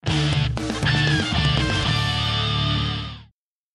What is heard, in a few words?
Success; Command The Toa Mahri; Flash Games; Game Music